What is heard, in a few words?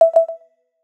ALERT GENERAL